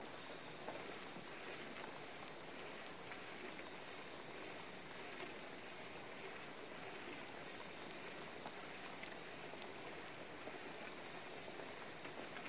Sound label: harbor porpoise